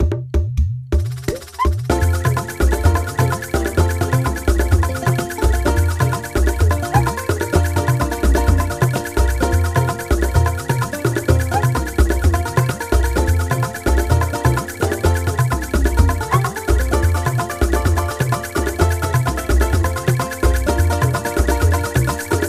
Pianta Village Band; Super Mario Sunshine

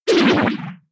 Item Disappear; MK7; Shippo

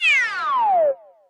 Flagpole; SM3DL

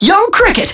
Young Cricket

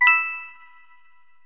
Coin, The "Coin" theme; NSMBW

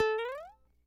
Small Mario Jump Mid

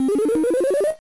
Vine; SMA2

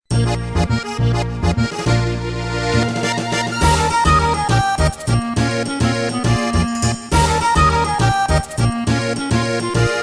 Walking Underwater

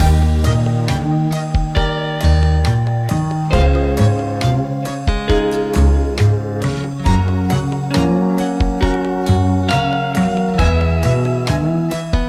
Riverside Station Platform

